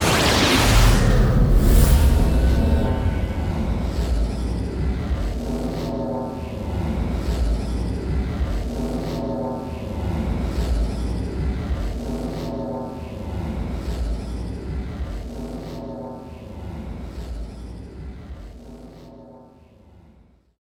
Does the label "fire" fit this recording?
Yes